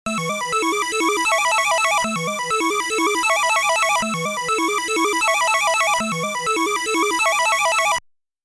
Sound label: Star Flash Sound